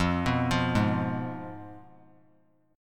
F Diminished; arpeggiated; 3C,3F,3A,3D; 17,18,20,18; JGuitar

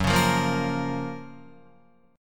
Gb Major 7th Gb down Guitar; GbM7 strummed; JGuitar

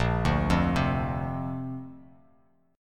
Bb Major Add 9th, Bb, down; arpeggiated; JGuitar

